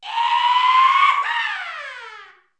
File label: LAS VEGAS ASTERISK; BigMax nº 7